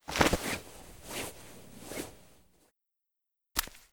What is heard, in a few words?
Crane Idle